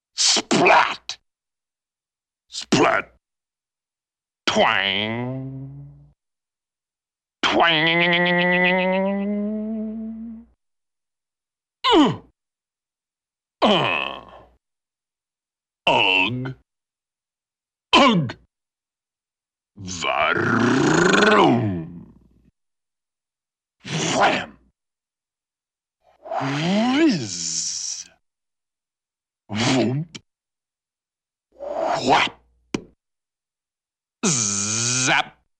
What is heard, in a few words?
Various Vocal Effects 'splat' to 'zip, Cartoon; Hollywood Edge; Cartoon Trax